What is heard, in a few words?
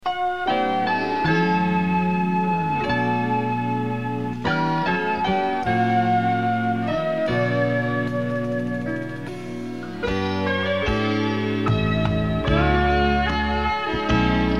Soft Instrumental; WCCO AM